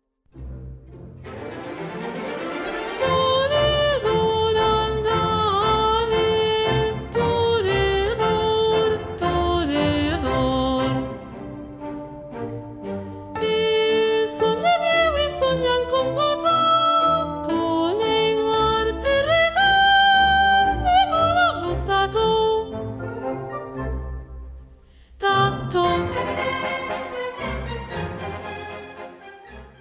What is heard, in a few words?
SOPRANO Toreador Song VOZ, opera soprano